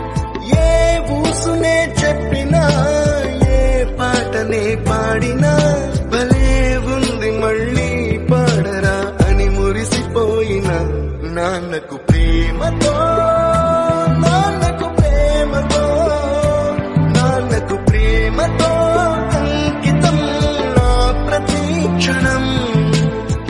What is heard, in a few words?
Tamil Song Ringtone